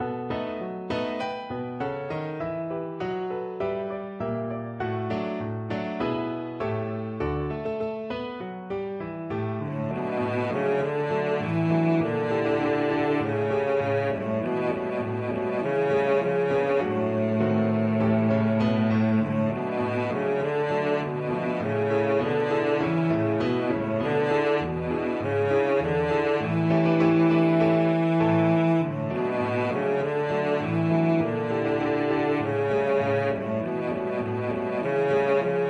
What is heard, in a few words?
cello